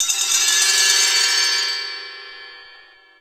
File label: shards, drum bass; Zero-G; Total Drum Bass; Weirdos